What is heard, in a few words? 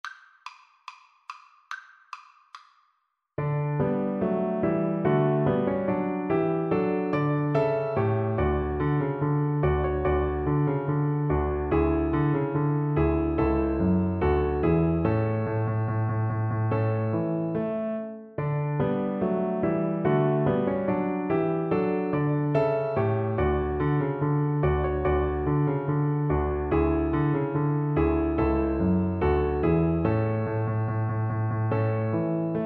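march in d VLC karaoke, Cello; bach; bwv anh 122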